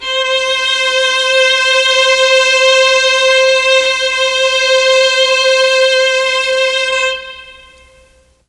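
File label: C3 violins, mellotron; CHMB 3 VLNS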